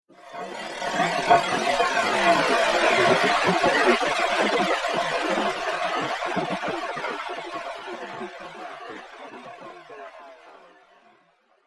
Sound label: Alien Laser Magic; Alien Sound Effects; Sci-Fi Sound Effect; Andrew Fleming